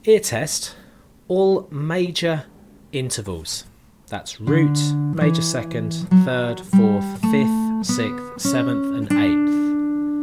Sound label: All Major Intervals Key Of D; 10 Interval Tests; working out songs by ear; ear study